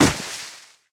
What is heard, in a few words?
kappa death fall; smite; kuzenbo